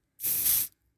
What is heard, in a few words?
Spray deodorant